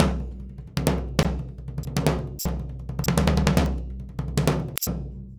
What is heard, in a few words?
SURDO, Modern Percussion Loops; Soundscan vol.56; AKAI; SURDO 100